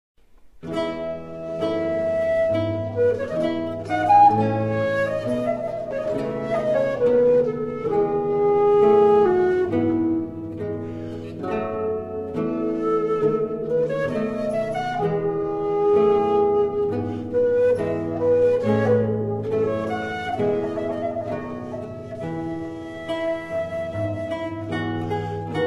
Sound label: music sonata; Minecraft; Votive Content